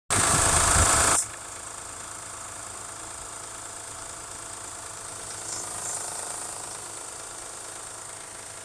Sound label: 04 3 0 v6 tapping noise startup noise